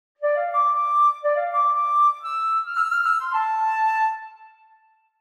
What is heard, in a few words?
Ocarina; Ocarina of Time